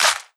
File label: Claps; Vengeance - Essential Clubsounds Vol.5